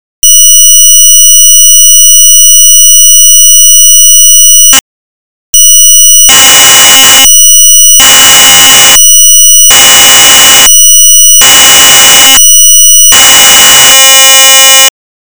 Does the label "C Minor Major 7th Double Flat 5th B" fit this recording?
No